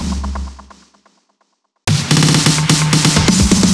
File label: Fills 128BPM, Clubsounds